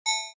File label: Rollover, Short; Mouseovers; Multimedia; Stuart Duffield